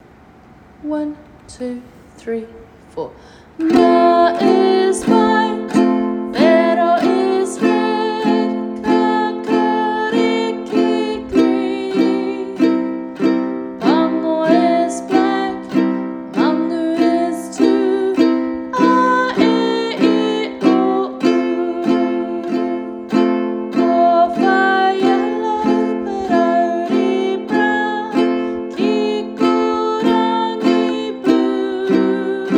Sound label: Uke; colour song; Uke book